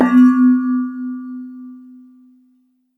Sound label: Water Bottle - Low